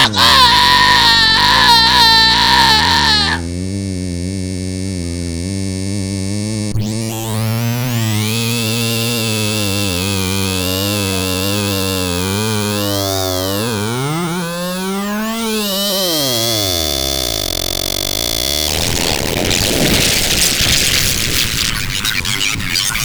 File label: Noise; Rimac Noise 1